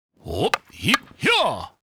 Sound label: red panda ground pound; netent